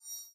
button Hover; halloweenjack

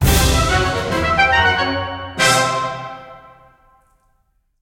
win Medium; lost relics

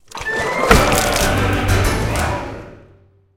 win bigwin; goldentavern

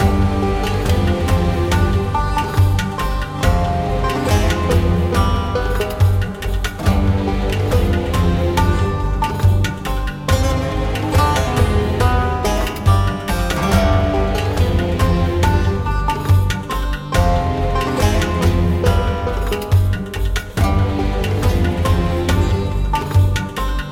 basic Intense Ambience; mercy of the gods

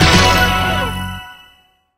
scatter Win; hotline2

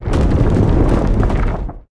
wheel snd; magic maid cafe

